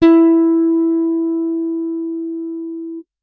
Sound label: bass electronic, electric bass finger